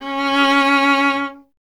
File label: STR VLA, Solo Instruments